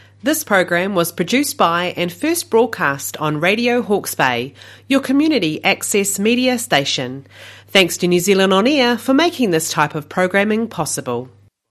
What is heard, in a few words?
Country faves